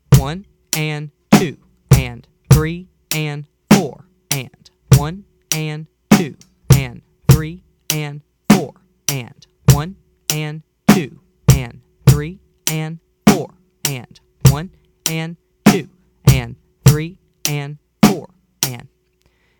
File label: Beat 2 With Snare